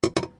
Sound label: spin